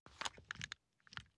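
foley assault rifle akilo47 reload empty mag movement; weapons; viper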